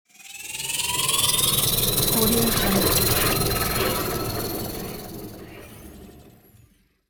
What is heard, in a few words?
futuristic transition